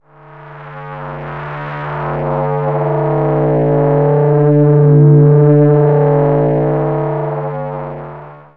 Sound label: pad; synth